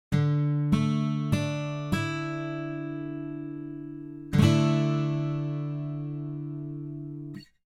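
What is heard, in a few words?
Guitar Dmadd9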